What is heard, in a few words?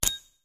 match; keno